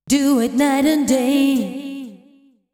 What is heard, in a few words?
deep house female, 125, A, vocals